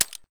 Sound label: grach trigger hammer; Escape from Tarkov